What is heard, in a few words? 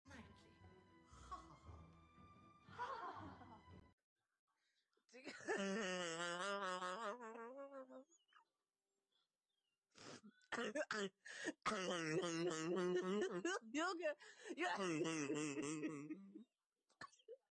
asthma laugh